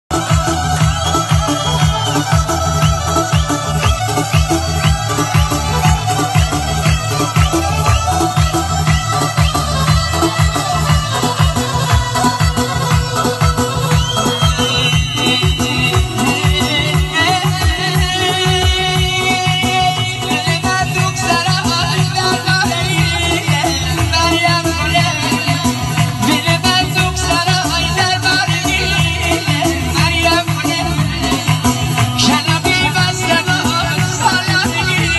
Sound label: Bandari